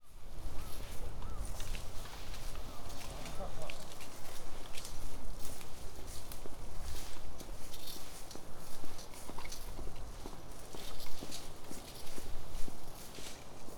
poolside

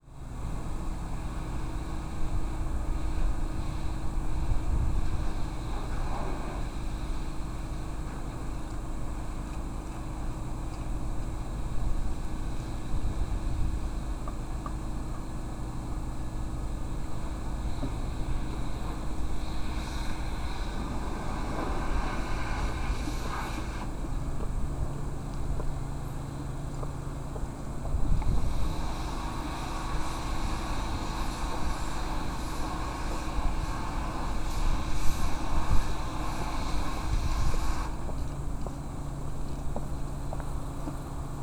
kanaya decontami

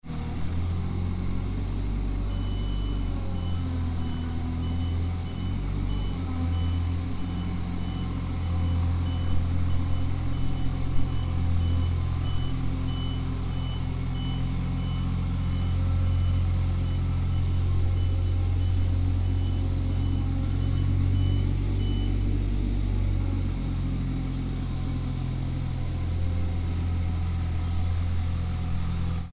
decontamination; fukudai; parking

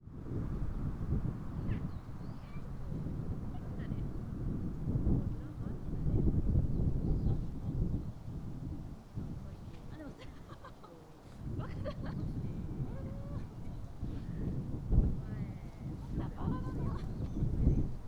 shinobu park swing

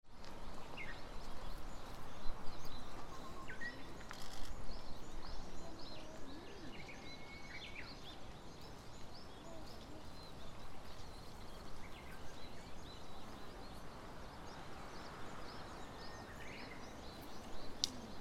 hanamiyama trail viewpoint

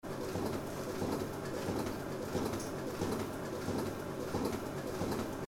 print

